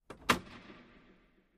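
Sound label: Hockey Penalty Box Door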